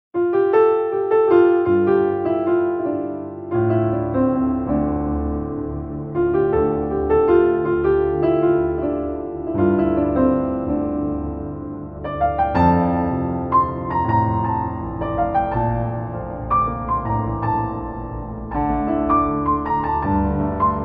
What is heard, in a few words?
Piano